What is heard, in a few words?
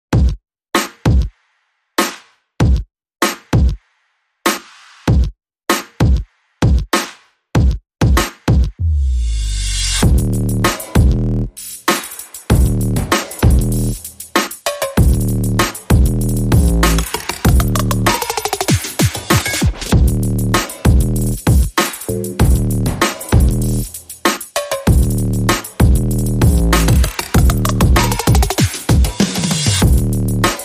Drums and Bass